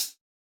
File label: Analog Hi-Hat, Electronic Drum Samples; Hi-Hats; E-Punk Project Drum Samples